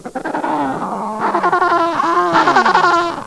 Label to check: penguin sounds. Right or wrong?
Right